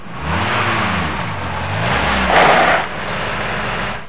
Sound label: trucks